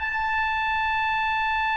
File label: TUBA; E-MU Producer Series Vol. 5; 3-D Audio Collection; 3D Multis; 3D Sprint Select 1